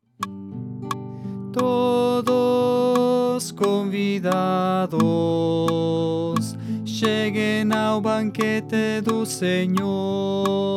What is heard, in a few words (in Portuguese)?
Cristo pão dos pobres Tenor